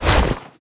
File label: mantle, foley